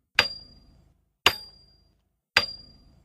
pick metallic

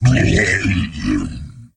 die, aliens; ortnok

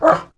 kobold bark

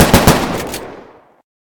bullet shotgun; ufoai; weapons

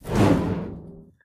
attack fire

